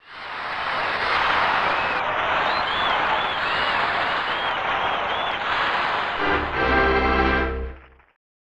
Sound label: Cheering; Mario Paint